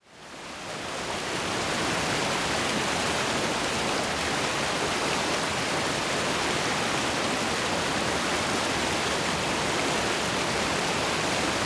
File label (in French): Rigole du Diable